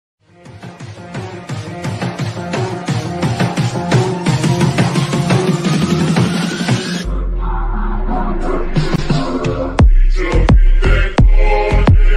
bad boy ringtone, attitude ringtone, calling ringtone; bgmi; pubg ringtone